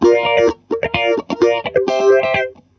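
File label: GTR, guitar; USB Soundscan vol.32; Roots Disco; AKAI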